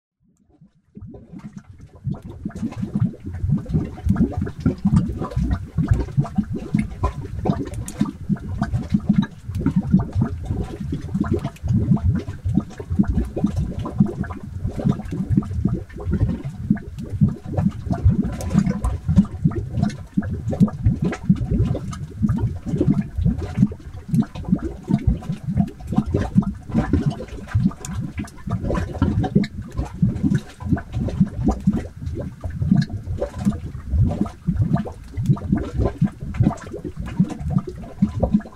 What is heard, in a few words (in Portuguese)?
Som Furna Enxofre; cavidades vulcanicas